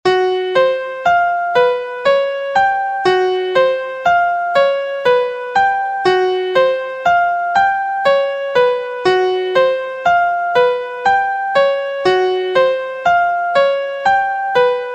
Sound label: Degree 1 b2 2 b5 5 b6 1st Inversion Key F; harmonic and melodic equivalence v14c trichord pair